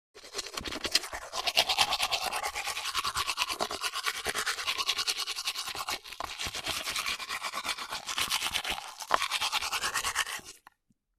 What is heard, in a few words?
Teeth brushing quickly woman